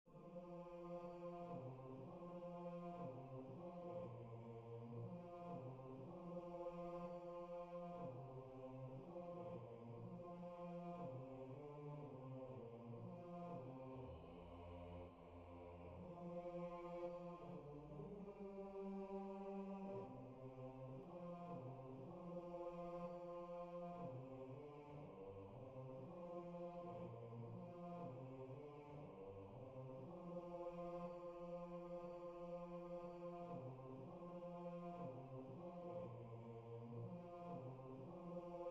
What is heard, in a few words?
Bass